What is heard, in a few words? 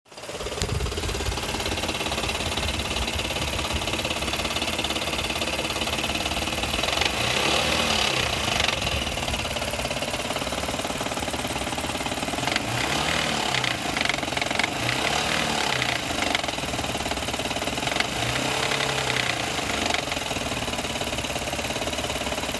engine knock and rattle; es 1st to 4th gen 1990-2006